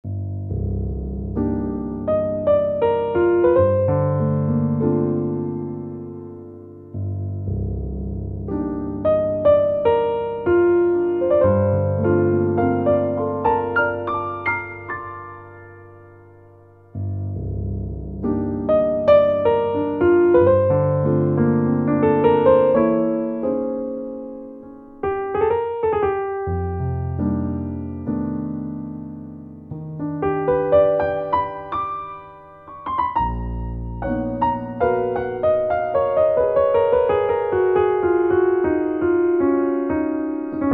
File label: Premier Grand, xv-5080; SRX